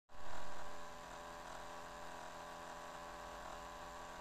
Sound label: dell xps13 fan noise